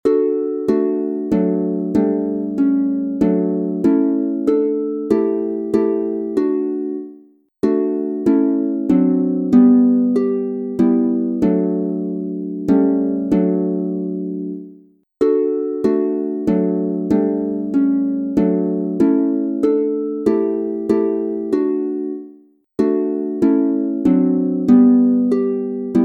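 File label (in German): D Dur 3 stimmig instrumental, Harfe; Lieder Kirchenjahr; Fest soll mein Taufbund immer stehn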